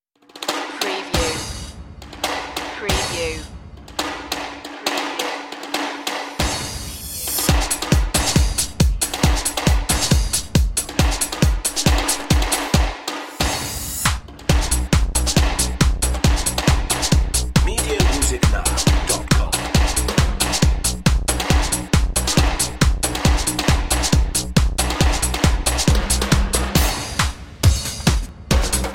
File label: samba party no crowd